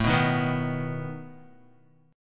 A Suspended 2nd Flat 5th Guitar, Asus2b5 chord; strummed

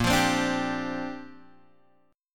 A sharp 6th Add 9th, Guitar, Open G; down

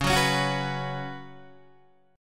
C sharp 7th Suspended 4th C sharp, Guitar Open D

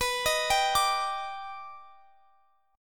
D sharp Augmented Major 7th B, chord

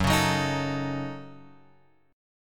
F sharp Minor 6th F sharp; F#m6 Chord {2 4 4 X 4 5} strummed

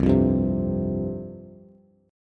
C Suspended 4th C, Bass; Csus4 strummed; JGuitar

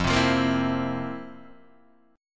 F Major 7th Suspended 4th F; FM7sus4 Chord {1 3 3 3 1 0} strummed

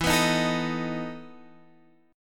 E Major 7th Suspended 4th E down

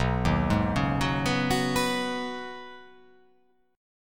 B 6th Add 9th B, chord

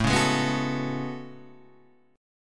A Major 13th, down Guitar Standard; strummed; capo=4

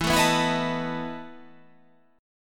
E Major 7th Suspended 4th

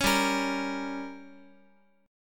G Diminished, Guitar; strummed; JGuitar

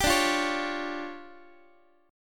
D Minor Major 7th Double Flat 5th, D, Guitar; DmM7bb5; strummed; JGuitar

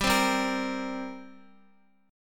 G Minor Double Flat 5th, G, Guitar; strummed

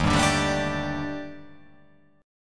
D sharp Major 7th Flat 5th D sharp, chord; chordshape

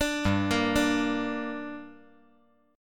G Minor G down, chord

